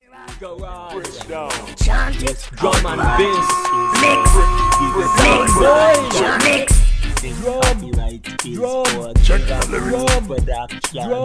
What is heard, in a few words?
REGGAE JAM